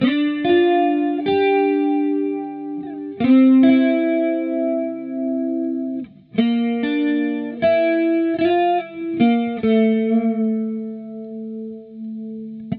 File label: Guitar Rhythm 75bpm D Lofi Chillout